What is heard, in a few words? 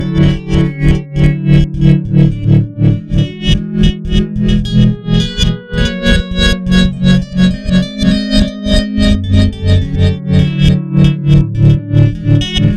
guitar loop; modelonia; guitar dry loop